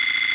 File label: score count loop